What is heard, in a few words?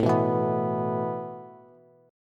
A Suspended 2nd A Bass